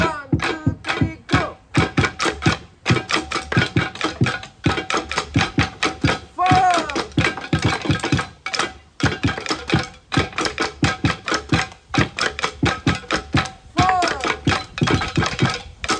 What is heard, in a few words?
roof tile music; lumbung commons; humdrum press